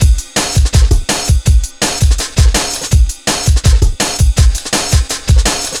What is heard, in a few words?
165, Drum Loops; 90 s Sample CDs; Total Drum&Bass